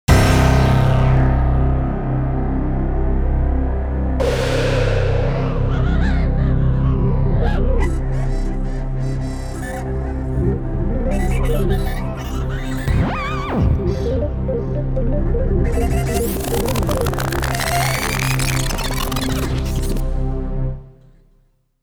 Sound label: suspense; Initial D Second Stage Sound Files